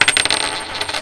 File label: coin toss; There; ThereClient